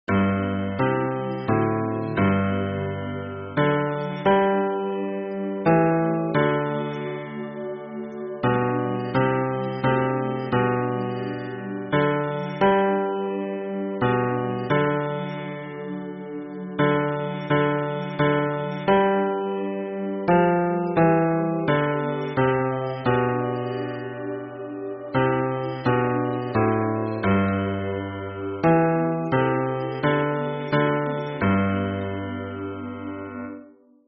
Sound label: hymn bass